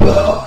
attack

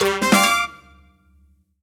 Jingle Kickoff; clubhouse games 51 worldwide classics 2020 switch gamerip

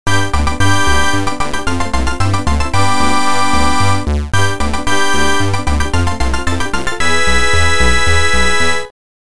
Congratulant Fanfare

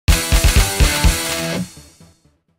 Level Completed; Absolute Blue

